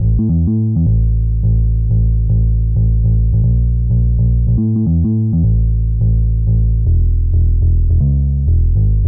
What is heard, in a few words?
Neo Soul